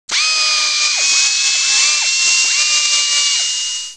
surg saw; Babylon 5 - Entertainment Utility

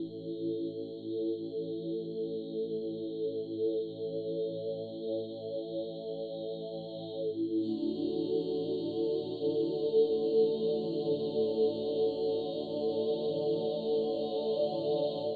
synth pad, ethno, 125, A; eJay; Sphere

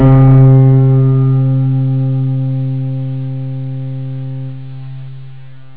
PIANO

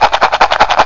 shake; Take 2 v1.0

